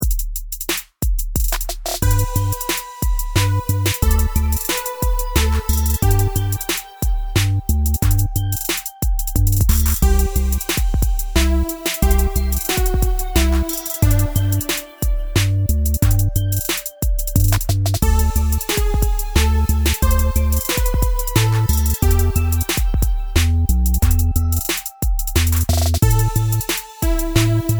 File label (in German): Leise rieselt der Schnee G Dur HipHop 3 Strophen Tempo 90